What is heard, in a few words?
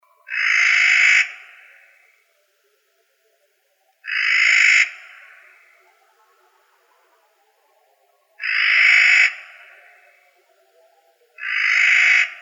Corvids; AZFO; deviche